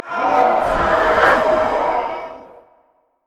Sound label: passive dead souls; LoL; thresh